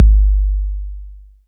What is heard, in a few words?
FULL 808 KIK; RAP CITY 1